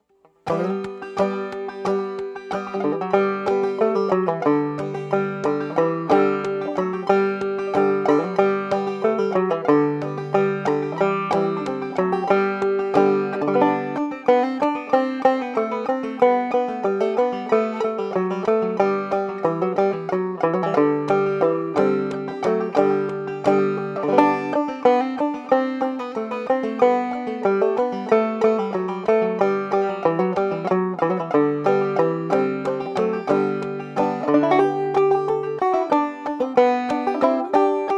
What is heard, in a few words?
ding both, banjo; Christmas